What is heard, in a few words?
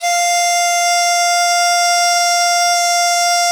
DIZI F4, Winds; E-MU Producer Series Vol. 7 – Old World Instruments